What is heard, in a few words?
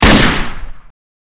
beretta m92; weapons; beretta92